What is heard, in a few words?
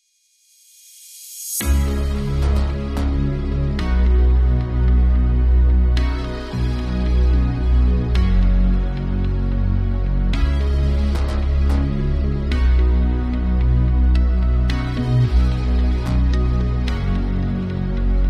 Ambient